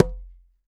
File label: CONGA L HEEL